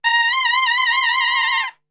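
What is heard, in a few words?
A# trumpet shake; Best Service; Brass Super Section; TRP SHAKE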